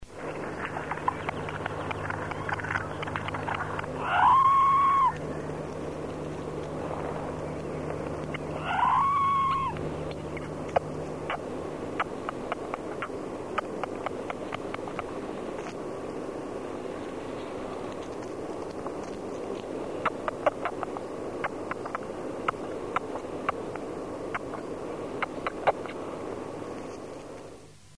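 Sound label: squirrel; veverka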